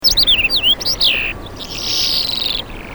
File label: Melanocorypha calandra